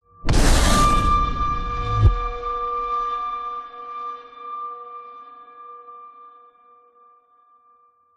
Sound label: horror impact dark agressive droning resonate hit metallic